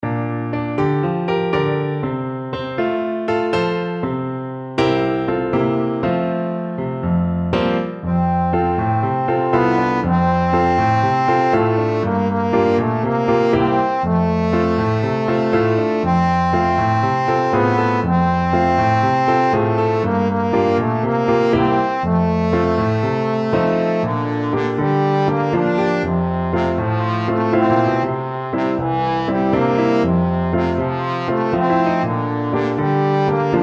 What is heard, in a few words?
Trombone Duet